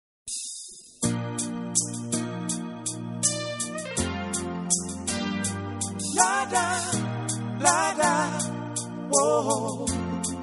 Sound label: reggae grooves